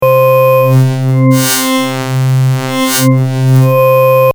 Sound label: scan